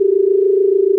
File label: Sci-fi Hover Bot Loop; SFX Kit; Sci-Fi Loops